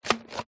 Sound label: leisure toy water gun pump action plastic dry fire